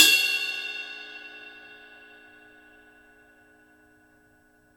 Crash Bell, drums singles; The Roots Drumloops

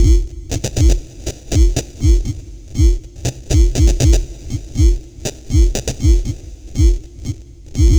Structured Rhythm, IDM Rhythms

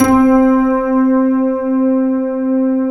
PNO HEAVN; EDENPNO

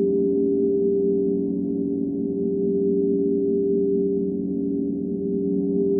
InfiniVerb Voice, sparse soundscape Loops

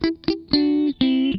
Funk Guitar; Zero G - Funk Guitar

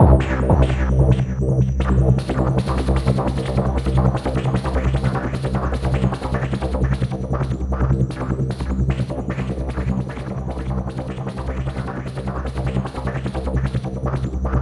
C2, Synth; NiceBeats

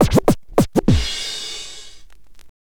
scratch short, Vinyl scratches; Masterbits; Vinyl Attack; scratches mix

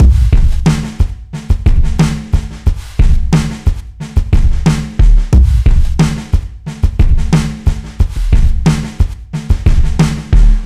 090bpm, Downbeat Dance Grooves

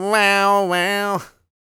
Vocal Planet R&B & Dance; ILIO

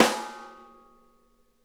SNARE EDG; Sonic Reality; Interactive Drum Kits

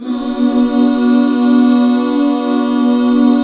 B MIN, Back Chords; Sound & Vision - Gigapack II CD 1

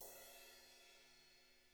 BRUSH CYM; Drums; ILIO; Double Platinum Drums 1

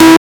CONGA DIST; Spectrasonic Distorted Reality 1; PHUZZ DRUMS

